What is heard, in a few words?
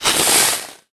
death; spider